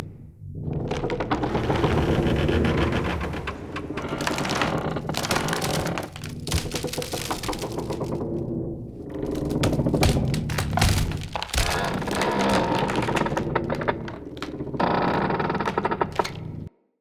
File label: Pull Crate Creak Loop; Encounters; Collector